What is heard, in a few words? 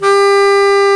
Bs Clarinet, Orchestral Winds; Lo Clarinets; Roland LCDP04